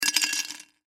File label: Jar Drop Pretzels Inside Glass; Food; Foley; Household; Blastwave